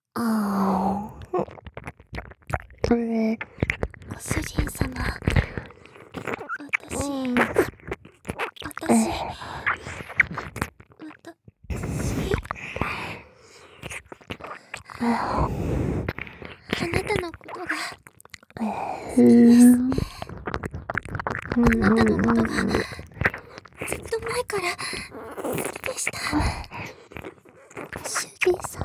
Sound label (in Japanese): 耳舐め 好き好き; 綾音に耳舐めされながら 、椿に好き好き言われる音声♪